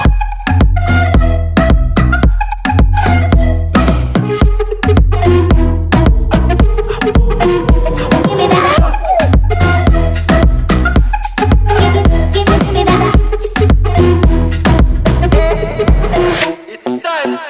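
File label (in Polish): iphone, dzwonki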